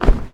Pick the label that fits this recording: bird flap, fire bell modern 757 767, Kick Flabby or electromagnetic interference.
bird flap